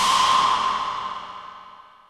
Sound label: IND; Zero G; Man Machine